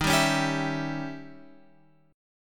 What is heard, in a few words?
D sharp 7th Flat 9th